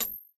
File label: reel Bounce; bloodsuckers2